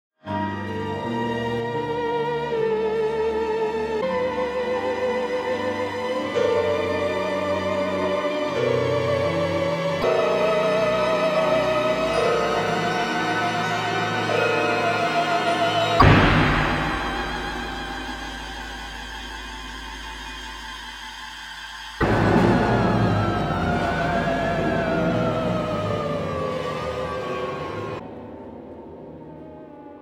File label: Glass Harmonica; Alfred Garrievich Shnitke